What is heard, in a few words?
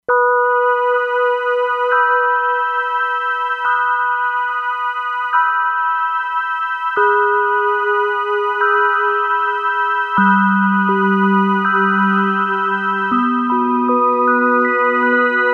E-mu Proteus-2; Factory Presets; Nice Night